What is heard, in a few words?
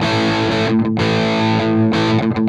Southern Rock, Guitars; ACID Music